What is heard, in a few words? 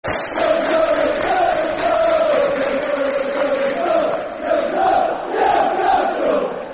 Newcastle, Football Chant (Soccer Song); Newcastle United Nufc